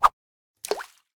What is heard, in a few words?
fishing cast